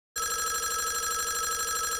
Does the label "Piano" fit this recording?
No